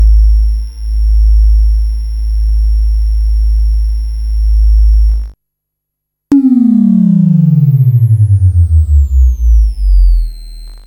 bass test

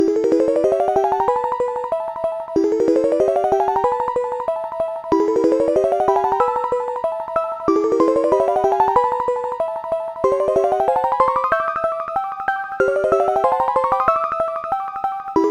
blip blip song; MusicLine

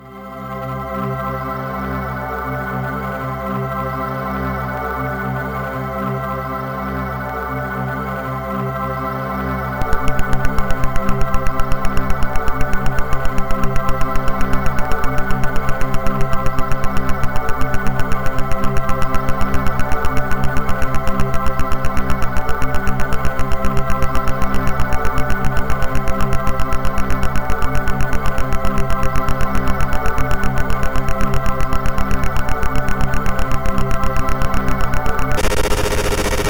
mod; robot vs korg

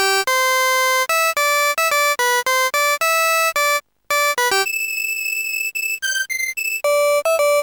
elven hymn; Deluxe Music Construction Set

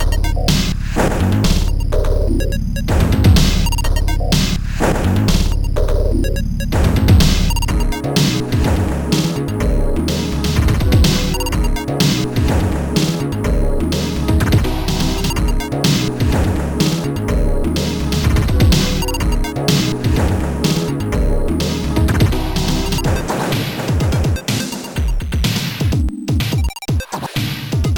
noise trance

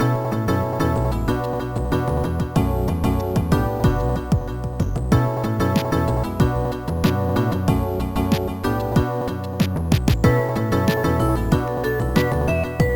chiptune

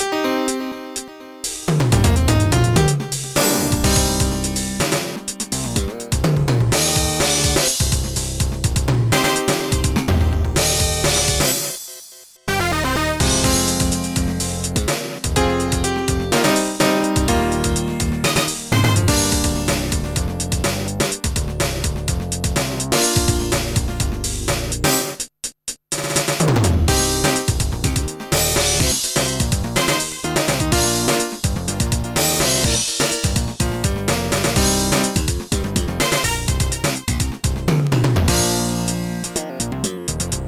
funky jazz; Trackers; Modland

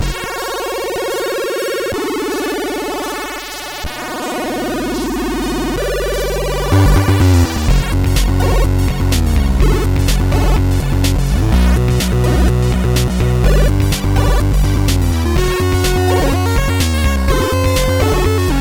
flat drums, mod; Trackers; Modland; Legend

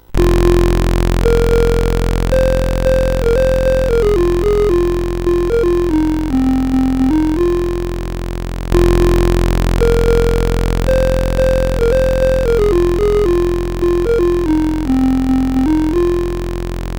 Laser Storm

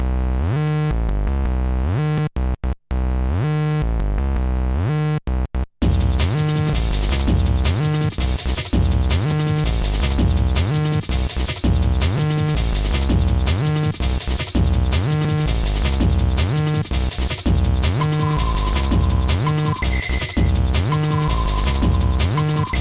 no melody yet; Trackers; Modland

